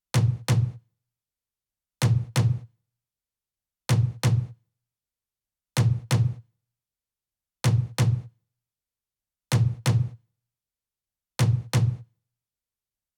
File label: Tom loop, Big EDM Drum Loops 128 BPM; Future House; Future House Tools